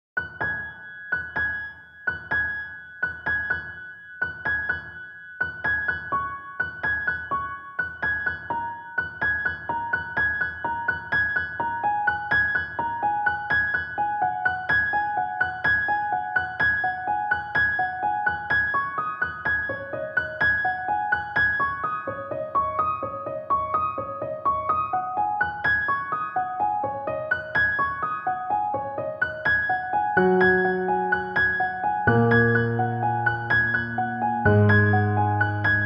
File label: piano Vignette